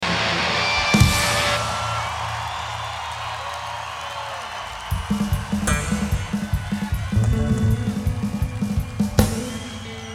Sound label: Drum Solo